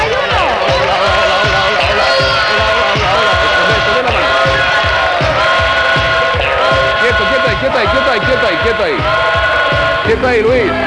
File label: mud horn, Mud pit alarm - This "dive siren"; is heard when a contestant will land on the mudwrestling